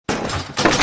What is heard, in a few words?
door drop